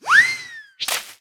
slide up down

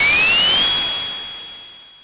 peel out; sonic

